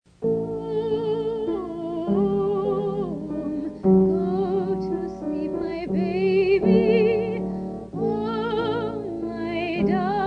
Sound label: Chants Lullaby